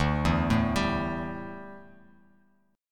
D Suspended 2nd Suspended 4th D down Bass Standard